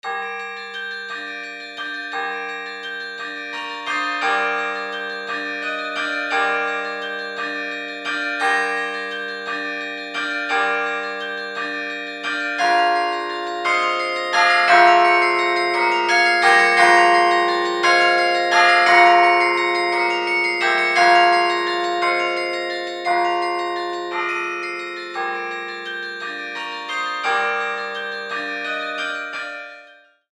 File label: Duet; Gretchen am Spinnrade